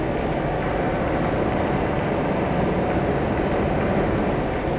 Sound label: Cracklature, sparse soundscape